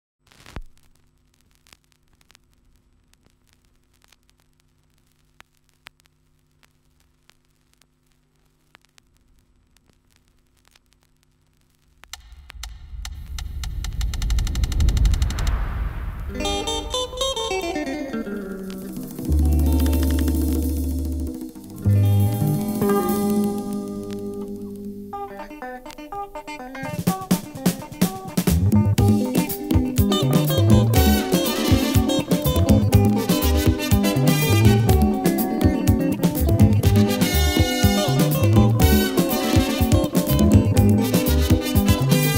Caribbean Rhythms & Afro-Latin Grooves, Champeta, Soukouss